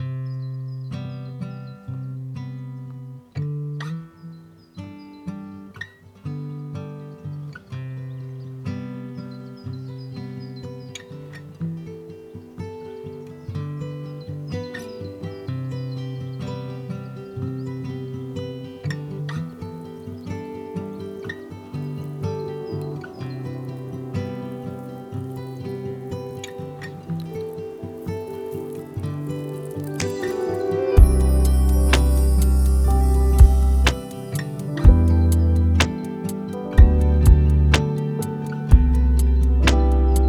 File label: lofi hip hop